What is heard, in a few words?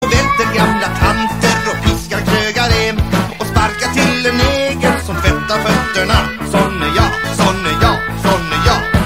shuffle